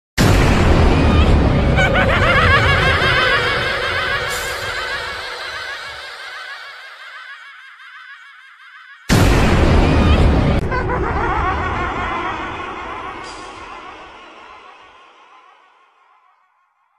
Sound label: zombies game over laugh; cod zombie